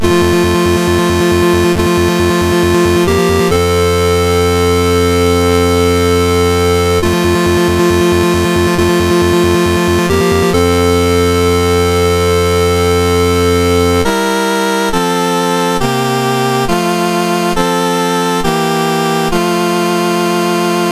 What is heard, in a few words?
MOS6581R2, National Anthems; Jordan